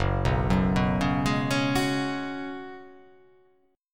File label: F sharp Suspended 2nd Suspended 4th